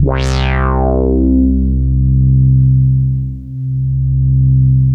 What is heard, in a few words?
2600 BASSICS, Vintage Keyboards; Big Fish Audio; First Call Vintage Keyboards